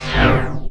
normal hit clap; osu

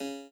chord

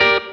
clean 9 10 11 F#m Staccato

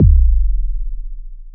impact

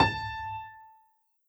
piano

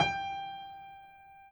piano

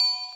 Bells

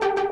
synth; osu

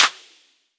Clap

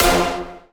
punch F5

